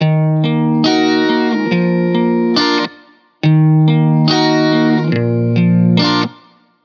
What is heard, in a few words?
Guitar Blues 140 BPM D#min, Guitar Loops; Ghosthack; Ghosthack Advent Calendar 2021